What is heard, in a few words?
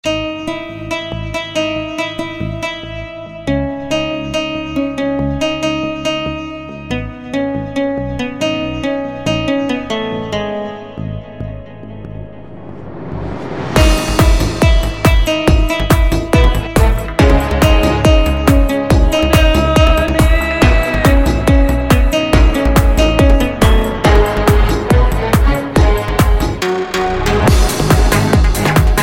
Rington Arabic; Sad